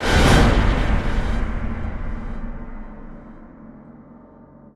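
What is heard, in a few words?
sting, horn percussion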